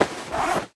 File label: Stab Vest Wear; Prison Architect